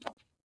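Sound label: concrete run right, footsteps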